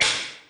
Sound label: push off